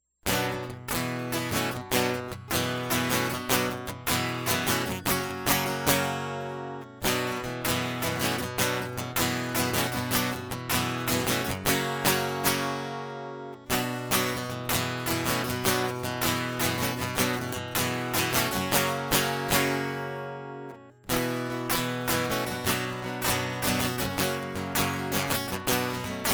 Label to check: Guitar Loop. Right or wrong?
Right